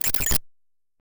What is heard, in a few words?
Emf Glitch Hit; Glitchedtones; Afterbern Democralypse; Windows